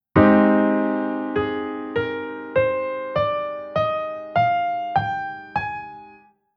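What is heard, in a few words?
Chord Scale Minor Natural Minor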